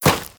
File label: Dirt Run